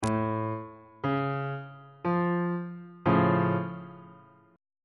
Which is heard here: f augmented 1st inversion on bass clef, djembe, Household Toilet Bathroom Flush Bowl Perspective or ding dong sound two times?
f augmented 1st inversion on bass clef